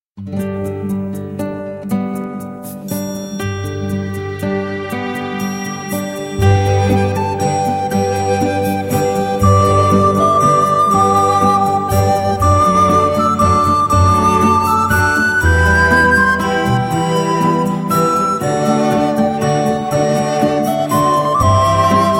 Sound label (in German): pastorale in g dur